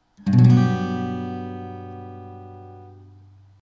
Gmaj7, chords, guitar

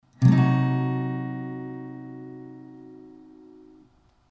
Cdim, chords, guitar

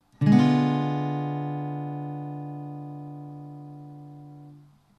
D dim, guitar chords

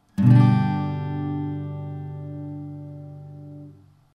G, Acoustic Guitar; Acoustic Guitar Neck

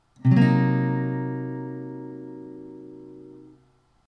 Cm7b5, Guitar chord; The D Chord Shape (Tertiary)

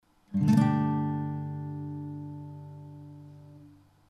Gsus2, Guitar chord

